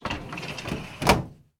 office filing cabinet metal close; sound effects one

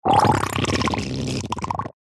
cartoon slime squelchy bubble dribble; zapsplat

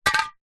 industrial can wd40 drop fall over floor

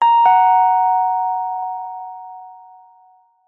household doorbell ring chime

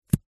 finger flick; human; zapsplat

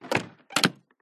household bedroom attic window close shut

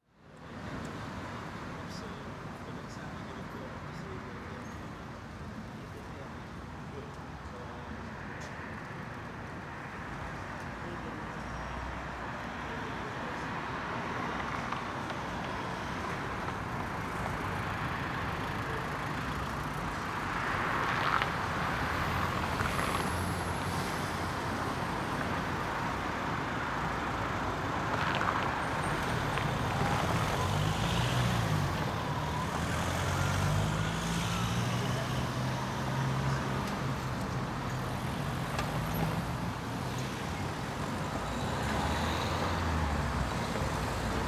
City Street Mid Evening; noise creations

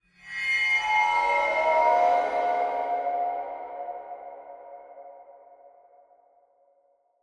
Ghost Movement; Blastwave FX

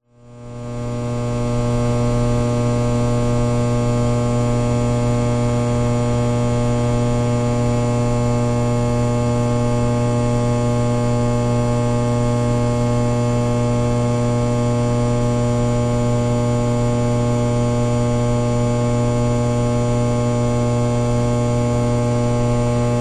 roomtone cable room electric buzz hum small

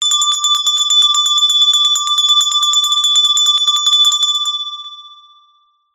bell med small school hand ring med

